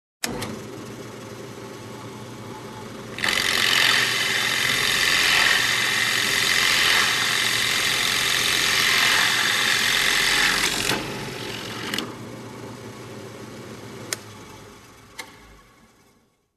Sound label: Construction